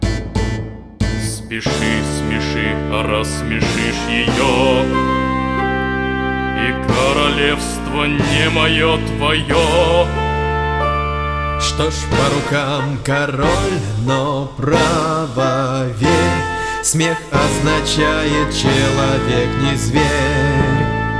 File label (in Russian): duet, detskij hor